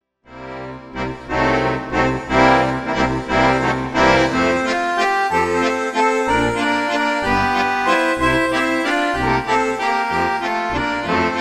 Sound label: uptempo; circus